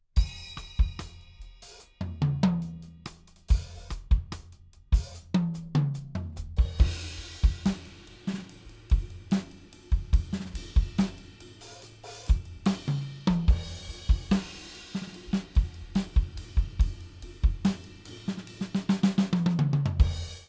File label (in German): dynamisches Klangbeispiel, Drum-Mikrofonset; Presonus DM-7; Presonus DM-7 Test